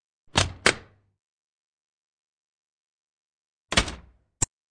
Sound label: Track, Doors; 헐리웃특수효과음; 문 소리